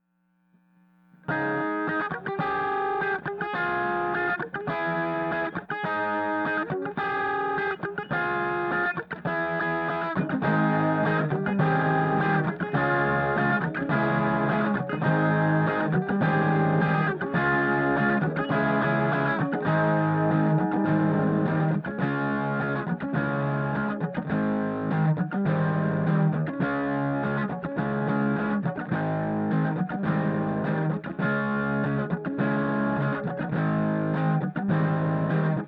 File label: guitar Bus no fx